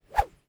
Whistle Fast Ultra; Whooshes

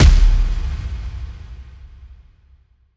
Slam Impact

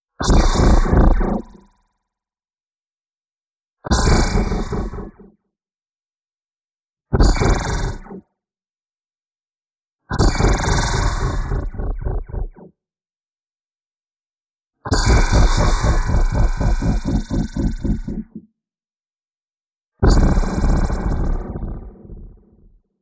Strong High, Electro

